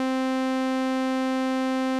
TB-303 SAW

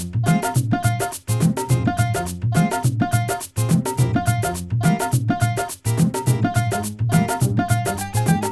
Music dry

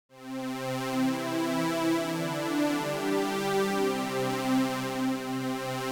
Synth sound

